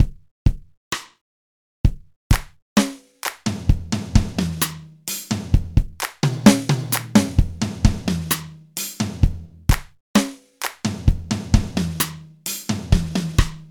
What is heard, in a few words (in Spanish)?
Ritmo Hydrogen